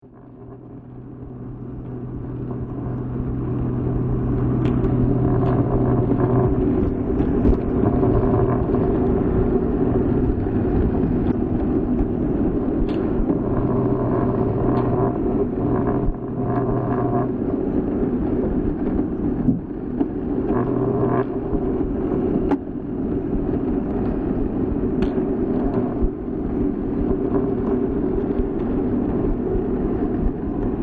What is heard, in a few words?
motor